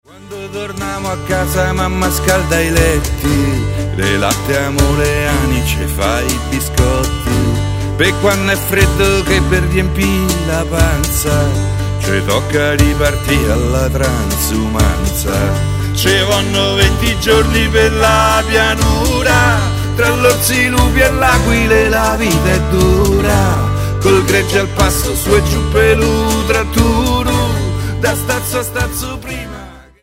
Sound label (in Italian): musica popolare; societa dei musici; transumanza